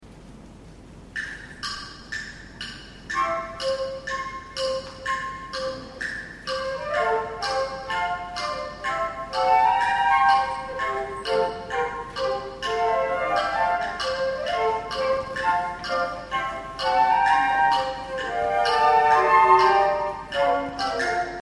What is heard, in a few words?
consert, flute; arietta-flute